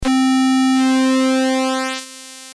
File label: Pulse Width